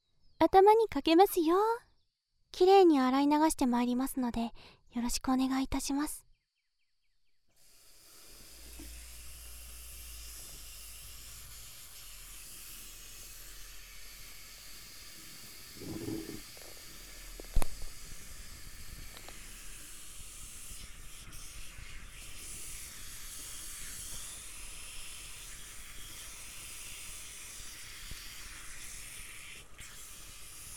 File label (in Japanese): シャワー-シャンプーを洗い流す-